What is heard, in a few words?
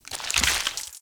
small gore; factorio 2.0.55